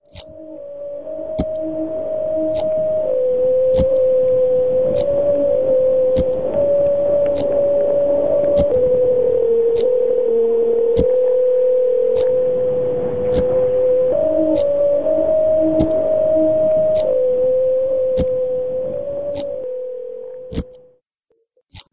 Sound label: Singing pipe dancing chain; Woomera Detention-Centre